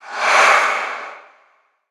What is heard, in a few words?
idle, mob endermen